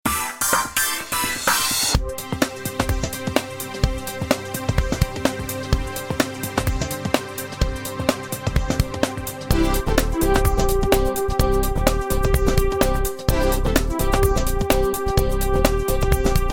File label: comedy stop outro